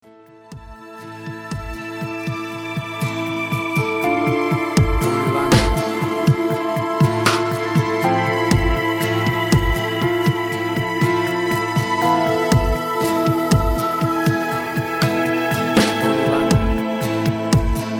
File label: Acoustic Nightmare; An acoustic nightmare